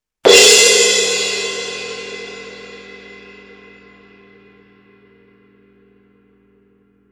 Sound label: symphonic suspended cymbal; AA Molto; Sabian